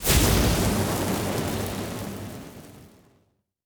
Fire Spell, Magic Sounds; Fantasy Sounds Bundle

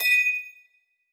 Jewel UI; Fantasy Interface; Fantasy Sounds Bundle

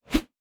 Whoosh; Fantasy Sounds Bundle

